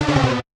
choice Down; itgmania; Themes default

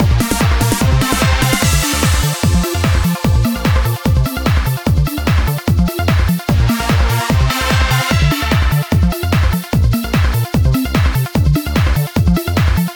Music title loop; itgmania; themekit

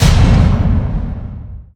failed; itgmania; ScreenGameplay

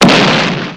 mine; itgmania; stepmania; Player

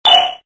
Menu Timer tick; itgmania; stepmania

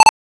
music wheel change; itgmania

